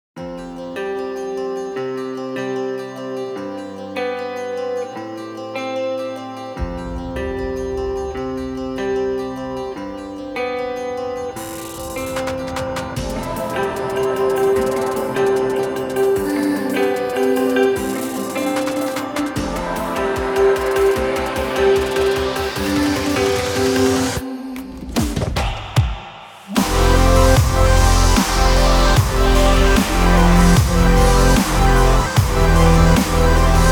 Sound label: ELECTRONIC